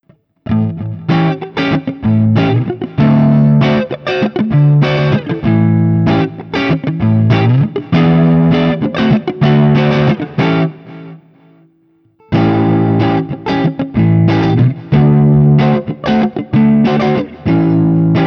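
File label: Guild X92 Citron, Clean, 7th Chords; Guild X92 Citron Breakaway from the depths of 1984; ODS100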